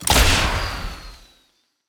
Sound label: Rocket Launcher Fire; Items; Shooter